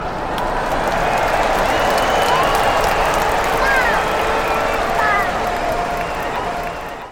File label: baseball cheer